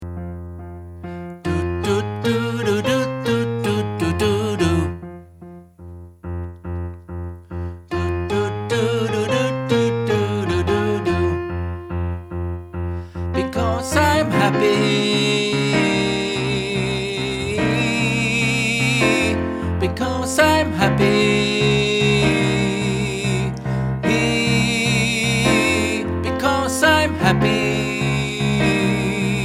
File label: TENOR